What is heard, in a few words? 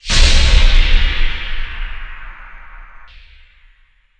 overheat; zrush